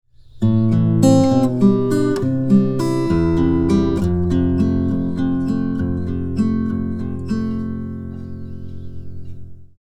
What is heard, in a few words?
Spread triad, guitar; Spread chords example 2